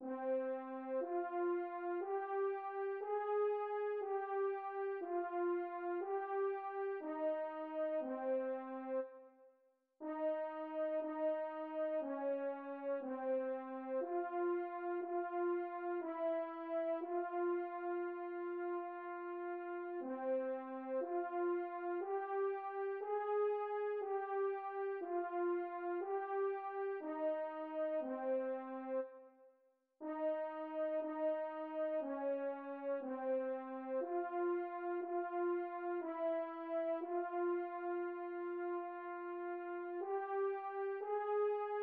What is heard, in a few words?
midi